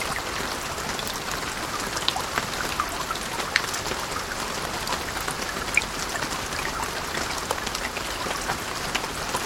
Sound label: rain